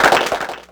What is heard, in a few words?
Clapbox Pitch5; Ableton Foundation FreePacks